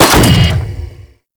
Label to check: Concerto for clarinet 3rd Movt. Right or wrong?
Wrong